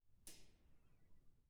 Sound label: Metal; TOOLKIT vol.2